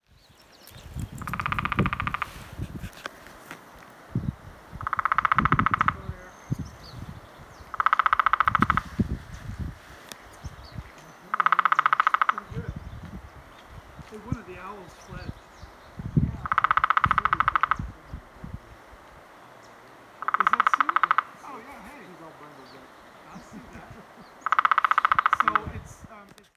Downy Woodpecker, Dryobates pubescens leucurus; woodpeckers

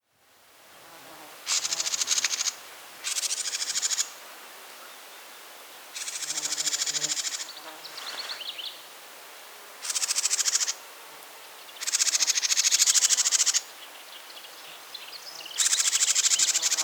House Wren, Troglodytes aedon parkmanii

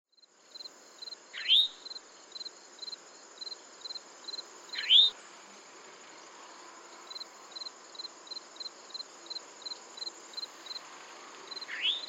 Eastern Towhee Pipilo erythrophthalmus; sparrows

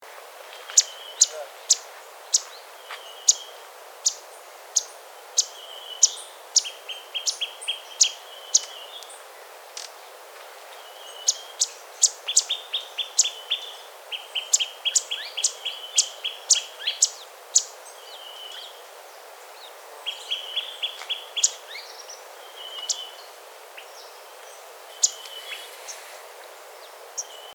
Blackburnian Warbler, Setophaga fusca

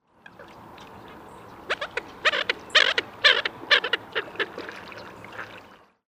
American Coot Fulica americana; waterfowl

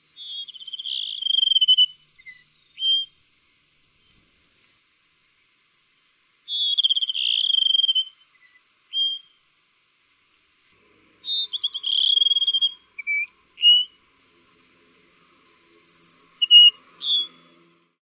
cassins sparrow song

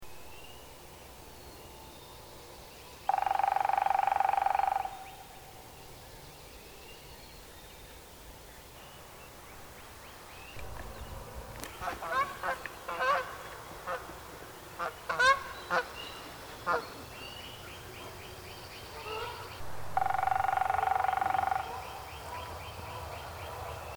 Red-bellied Woodpecker, Melanerpes carolinus; woodpeckers

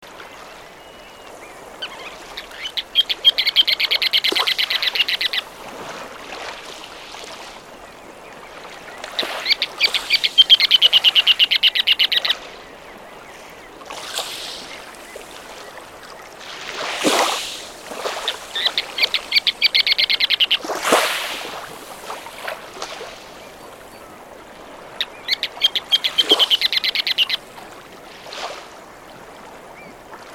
Ruddy Turnstone, Arenaria interpres; shorebirds rails

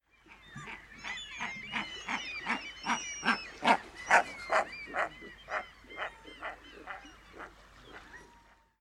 Red-breasted Merganser Mergus serrator; waterfowl

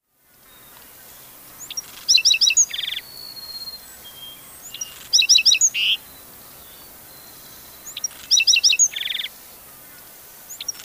Black-throated Sparrow Amphispiza bilineata; sparrows

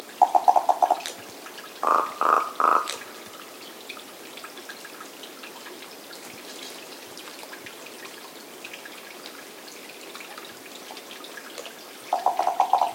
Common Raven, Corvus corax; jays and crows

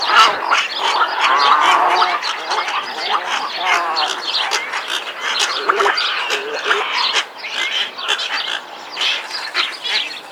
Cattle Egret, Bubulcus ibis; wading largewater

